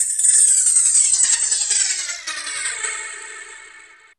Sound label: LOOPS